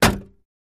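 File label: Metal Puncture Clank, small impacts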